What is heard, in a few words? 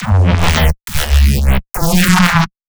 reese